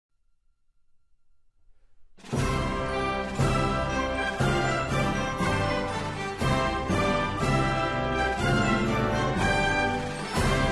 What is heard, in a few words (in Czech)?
narodni hymna spanelska instrumentalni; la marcha real